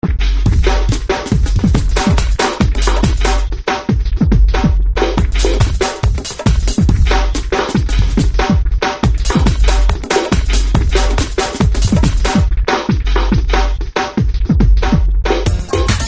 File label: hiphop